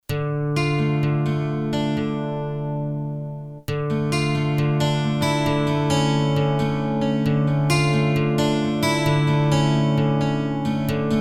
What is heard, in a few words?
Flanger II + Vocal Hall; t.c. electronics M300; Engine 1 + 2